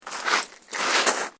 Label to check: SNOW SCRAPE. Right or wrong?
Right